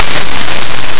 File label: explode